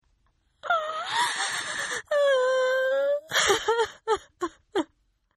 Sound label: Crying Female; Voice; Partners In Rhyme